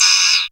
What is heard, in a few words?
synth, Dance Synths Trancers; ProSamples vol.37; Best Service